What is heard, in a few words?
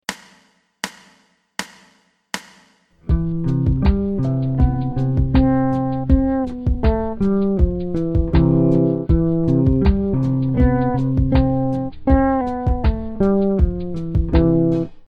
fretless bass